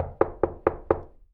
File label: KNOCK; 1000fx